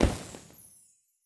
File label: Special & Powerup, Interface and Item Sounds; ArmyRaid